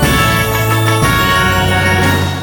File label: win; fish; tower client